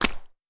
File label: Mud